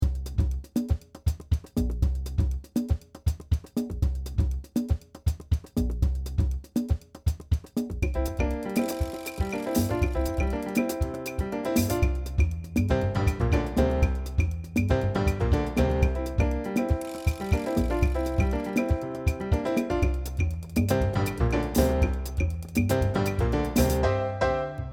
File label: Funky Groove